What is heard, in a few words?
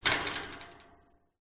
impact metal fence chicken wire vibrate